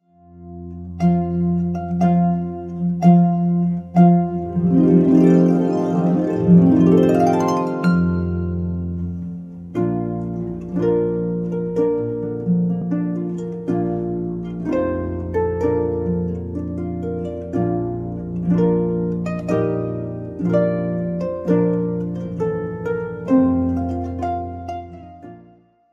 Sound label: harp